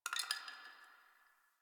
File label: Ice Crack One Off